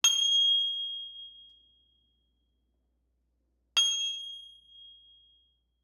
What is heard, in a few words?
int metal impact resonant ring high pitch; ambisonic; sonniss gdc